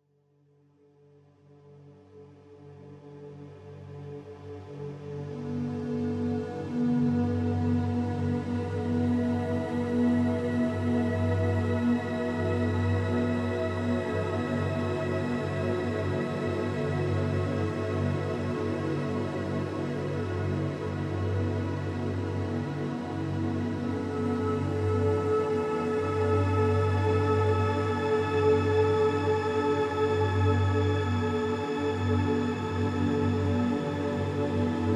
Ambient Fantasy Music Tranquil Atmospheric Ambience; Azuremyst